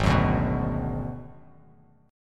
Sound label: G sharp Minor 7th Flat 5th G sharp down Bass Standard; G#m7b5 strummed; JGuitar